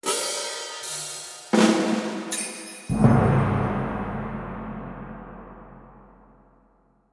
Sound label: perc transitional